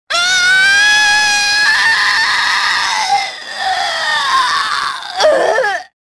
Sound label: falling; tavion